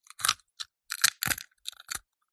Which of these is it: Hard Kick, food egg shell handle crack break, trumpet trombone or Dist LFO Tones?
food egg shell handle crack break